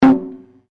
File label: DRUM TAIKO SHIMEA F, Small Japanese Taiko drum; Miscellaneous Musical